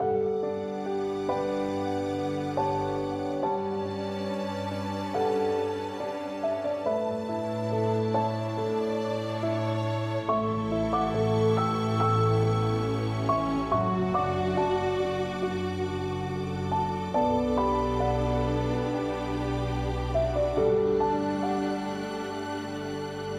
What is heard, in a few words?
Cinematic Music - Love; LittleBigPlanet Karting; PS3